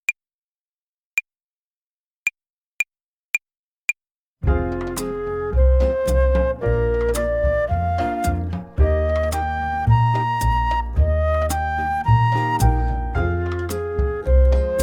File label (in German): Akkordzerlegungen Übung 4, Play-along Slow, Jazz Club Querfloete